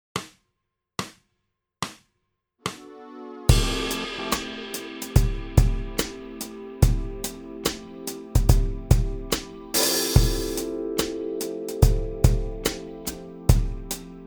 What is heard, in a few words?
swing half time shuffle